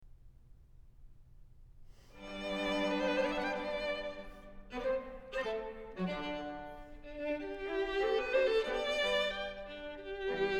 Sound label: String Quartet No. 2 in G Major, Allegro; Ludwig van Beethoven; Beethoven - String Quartets No. 1-6, Op. 18